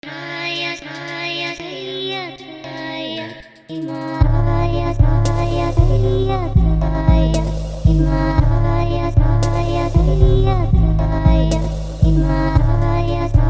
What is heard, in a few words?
instrumental